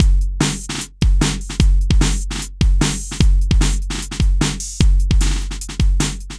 V Drum Loop

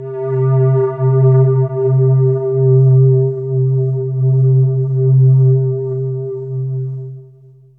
SYNTH, C2; ALIENS; AMG; Global Trance Mission VOL-2

